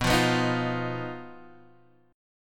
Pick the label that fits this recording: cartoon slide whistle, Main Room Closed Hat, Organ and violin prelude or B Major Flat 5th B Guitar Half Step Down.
B Major Flat 5th B Guitar Half Step Down